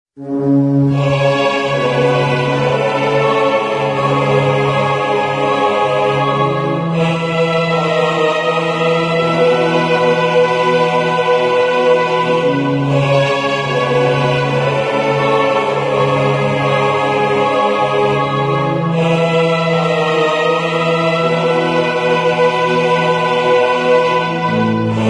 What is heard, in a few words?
Symphonic Metal